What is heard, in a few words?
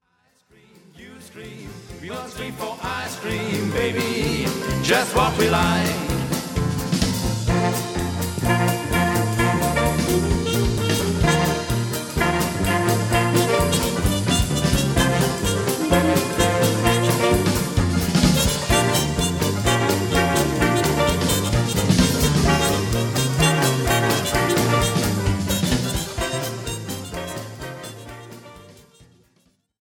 Dixieland Medley